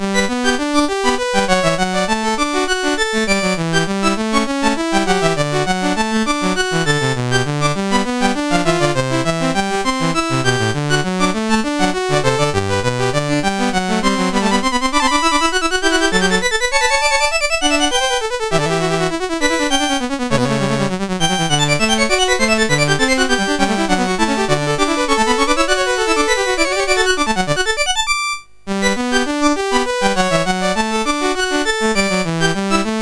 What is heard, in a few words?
Variation, MOS6581R4; 20; Goldberg Variations